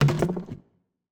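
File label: Collision Traffic Cone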